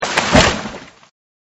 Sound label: Tree Fall; Sunflower Land